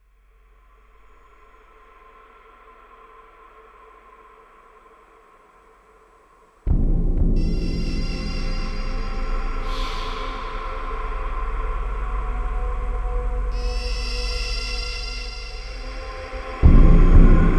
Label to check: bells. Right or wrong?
Wrong